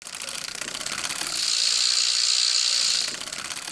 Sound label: tandem bike run